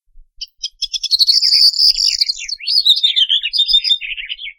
proyer

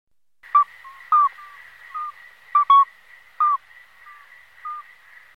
accoucheur

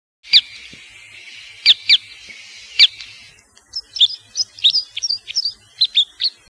bec croise

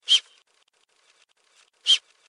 bouquetin

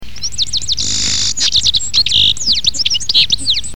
venturon

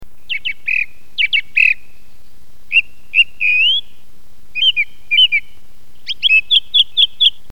grive, Musique

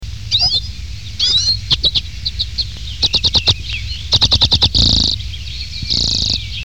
sizerin

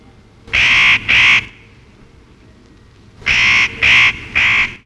casse noix

